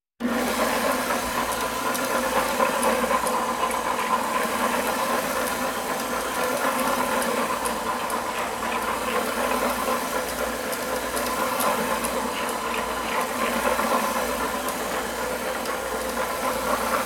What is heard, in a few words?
Walking Machinery Trucks; Valentino Production Sound Effects Library CD50